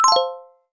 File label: Bells Downward